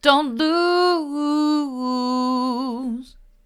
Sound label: don't lose mid, vocal